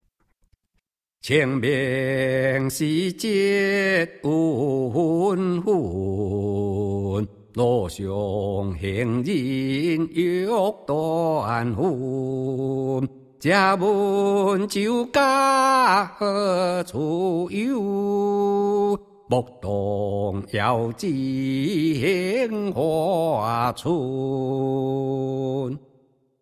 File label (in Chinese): 清明-吟誦